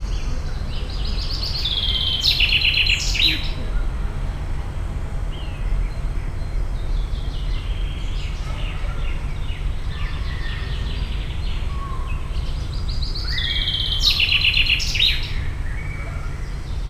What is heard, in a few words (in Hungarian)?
hím madár éneke, erdei pinty; szegedi zoo 2011